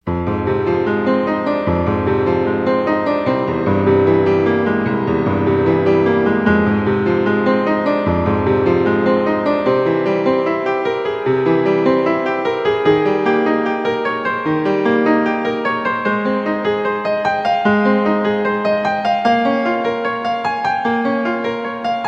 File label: Prelude in F Minor, Solo Piano